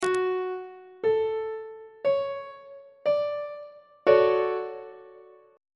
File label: d major 7th 1st inversion on treble clef